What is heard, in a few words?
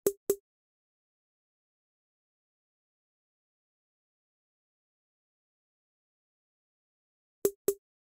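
Drum Loop 130 BPM Percussion; Loop Stems; Cymatics; Vibes II Drum Loop Collection; Genie Drum Loop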